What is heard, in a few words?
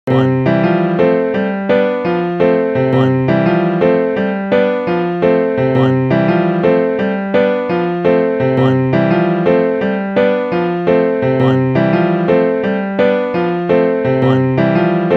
piano count